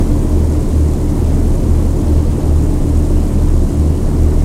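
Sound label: avro lancaster cockpit right, engines; War Thunder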